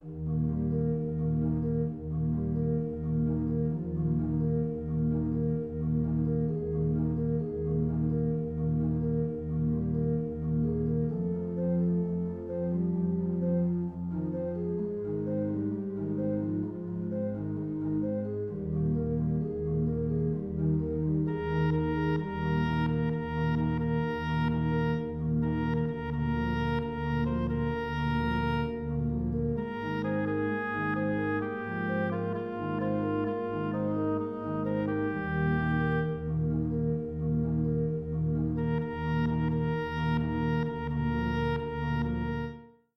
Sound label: Wedding song